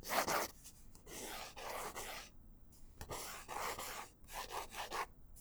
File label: Mech Pencil Lines; bb - Books, Paper, Writing